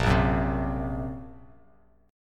A sharp Suspended 2nd Sharp 5th A sharp, Bass Standard; A#sus2#5 strummed; JGuitar